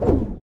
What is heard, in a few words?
Powerup Trigger Exit